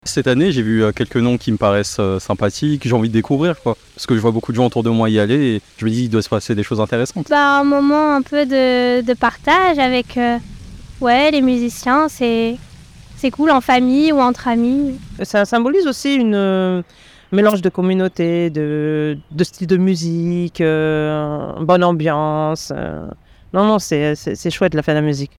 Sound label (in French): SON FETE MUSIQUE